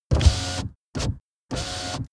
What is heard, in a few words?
car window back open unsteady